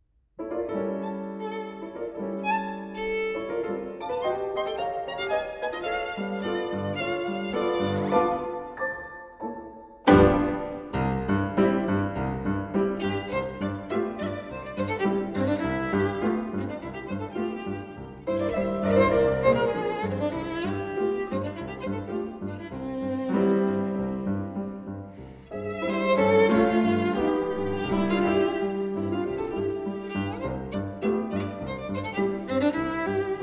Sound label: for violin & piano; Polichinelle; Kreisler